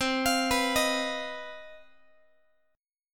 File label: C Minor Major 7th Double Flat 5th chord, Guitar; CmM7bb5; arpeggiated